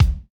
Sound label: kick, house